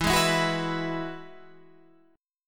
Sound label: E Major Add 9th, Guitar Drop D